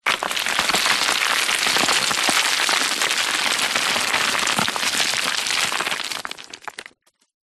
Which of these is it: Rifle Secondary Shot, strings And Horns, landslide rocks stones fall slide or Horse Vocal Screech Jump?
landslide rocks stones fall slide